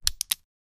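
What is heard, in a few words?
arrow releasing sound; archery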